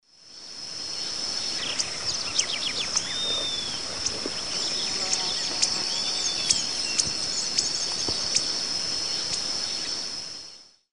Heliomaster longirostris, feeding calls; S. America; Eastern Lowlands

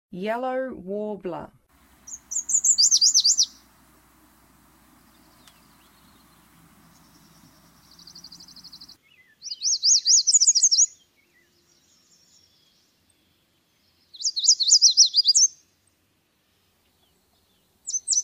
Yellow Warbler; North America

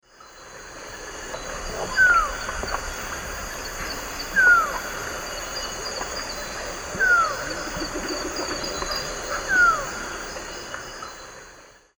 Micrastur mirandollei, calls; S. America; Eastern Lowlands

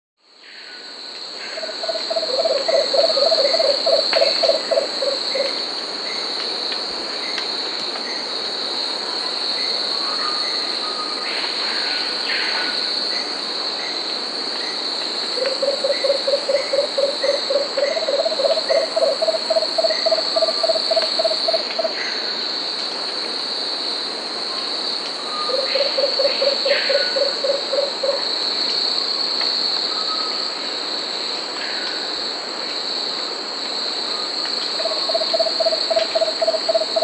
Lemon-throated Barbet (Eubucco richardsoni), Peru; S. America

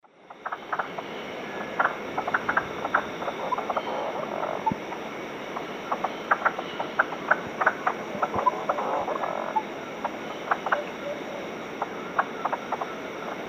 Cyphorhinus arada, group calls and subsong; S. America; Eastern Lowlands

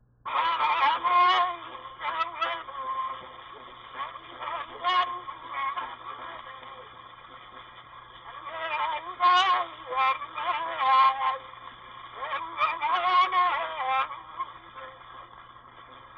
Name chant, Hawai'i; World Music; Hawaiian; for Queen Kapi'olani; Na Leo Hawai'i Kahiko - Voices of Old Hawai'i

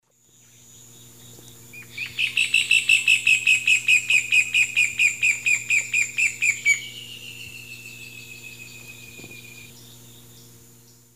Furnarius leucopus, duet; S. America; Eastern Lowlands

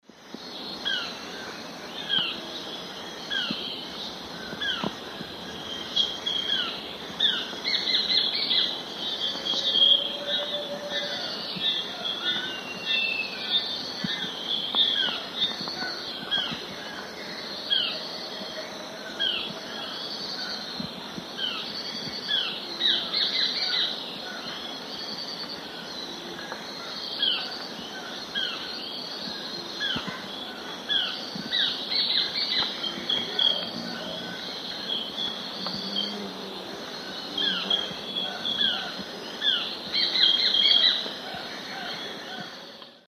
Myiozetetes luteiventris, dawn song; S. America; Eastern Lowlands

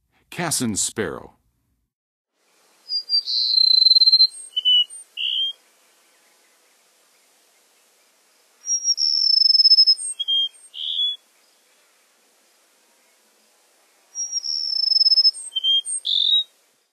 Cassins Sparrow, Songs of Birds of North America; Western USA; Western USA Songbirds

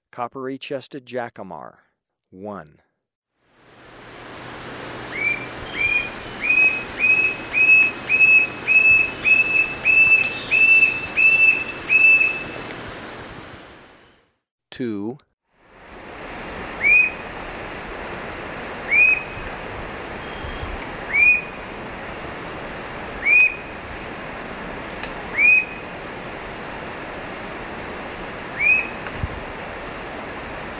Coppery-Chested Jacamar, Birds of Ecuador; S. America

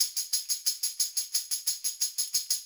- Brass Tamb; Rock; Percussion

